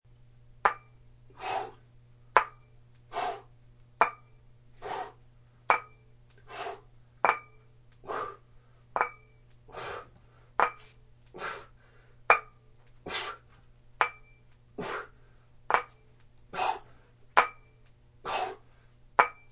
Lift Weights, Sound of heaving breathing and weights clanking during biceps set.; Weightlifting Sound Effects